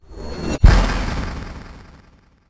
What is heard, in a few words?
death explosion